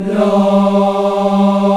VOX, African Aahs, African Las

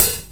hat noisy; Sonic Foundry (Sony Creative Software); Ugly Remnants VOL-2; Beatstuff

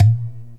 INDIAN PERC

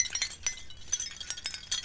Breakbeat Twinkle Loop

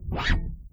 STNG NOISE, PEDULLA BASS; Masterbits CLIMAX 7 - Bass

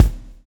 swing

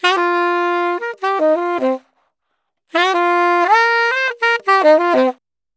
Sax 120bpm Ab, Saxaphone; Quazibeat; African Secret

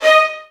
VN FX, Virtuoso Strings

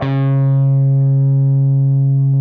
PRS D, Guitars, LEAD

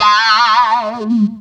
Guitars, Bb; Total Drum&Bass; 90 s Sample CDs

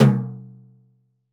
Lite Tom, Roland BK-5

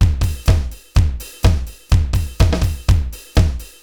Akai XE8 (125BPM), beats

